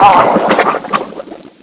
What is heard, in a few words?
drown; action-quake2; quakeguy